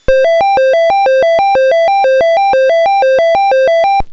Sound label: connect